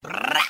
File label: brrrap, Voice; ooh ahh